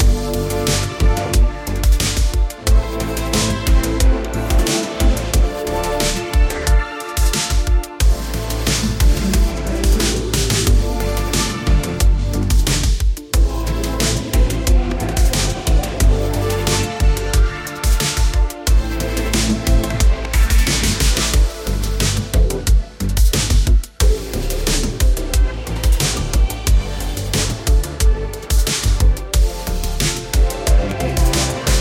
tokyo future funk city pop 1980s anime synthesizer nostalgic